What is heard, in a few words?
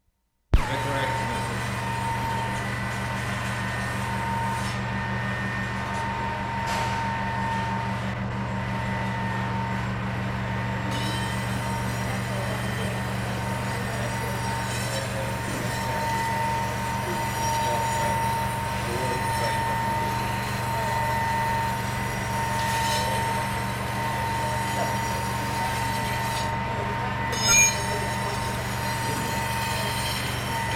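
CORRECTION MACHINE, GLASS FACTORY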